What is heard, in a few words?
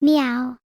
meow